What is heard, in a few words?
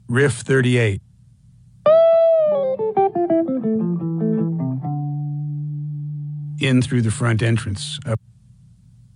Riff